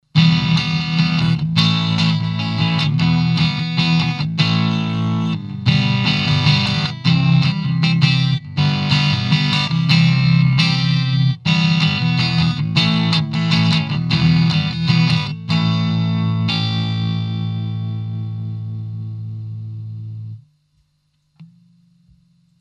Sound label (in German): E Gtr Kick on Boost on; Telefunken M82